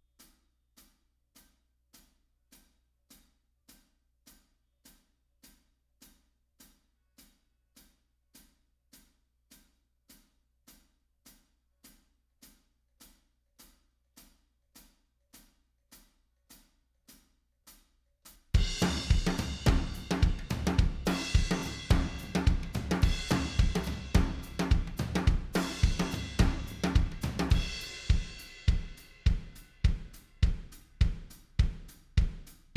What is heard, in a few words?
drums